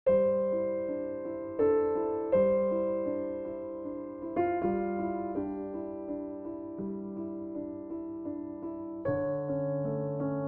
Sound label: Gentle Waltz, pianoteq model b; pianoteq7